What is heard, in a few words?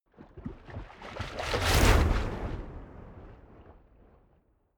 waves splash